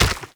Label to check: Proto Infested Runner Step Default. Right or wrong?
Right